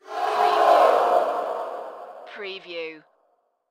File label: Goal